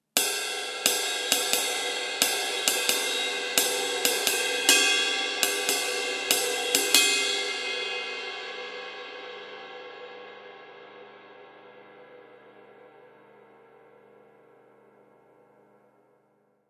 Meinl B21MR

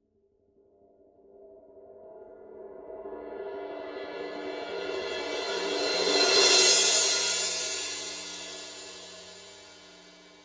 Paiste rude 18 thin crash roll

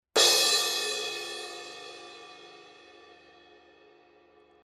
Sabian 18 Paragon Crash

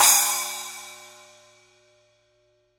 Paiste PST8 10 rock splash edge

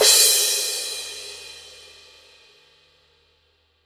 Paiste signature 16 mellow crash edge

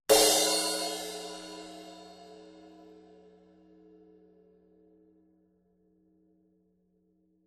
Meinl HCS18C

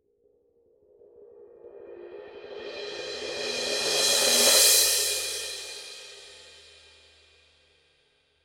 Paiste signature 16 mellow crash roll

